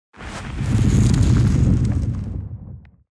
fire

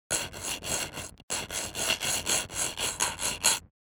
scraching matal

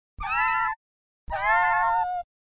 CHORUS

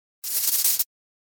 SHORT CIRCUIT

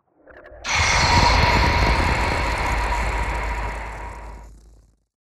Thunder element

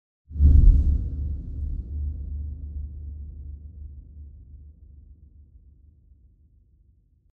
Horror

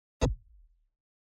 UI moving option to one anoter

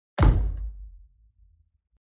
unleash arrow

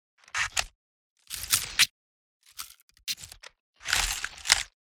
ROBOT SMALL

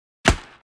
BOW hits

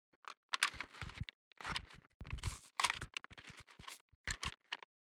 Ranger movement

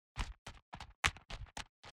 Cloth movment

